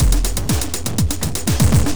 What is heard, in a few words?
Processed Beat, Drums & Percussion